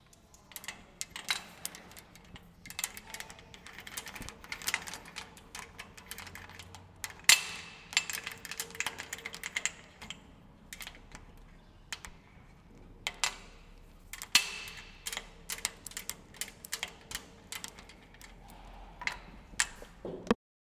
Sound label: Climbing Gear Clips Clanging